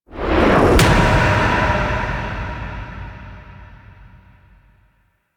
Focus Gain Message; Warframe; Focus Boost

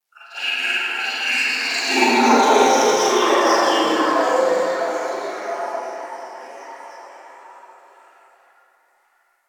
Ice Distant Robotics; Warframe; Corpus Ice Planet

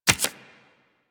Tarot UI Card Click; Warframe; Harrow; Tarot Emotion Choice

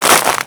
Hammer Pull; Warframe; Grineer Hammer Boss; Foley

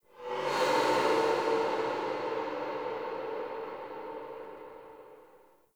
Hub Texture C, Ambience, Dungeons, Under City; Silent Rose

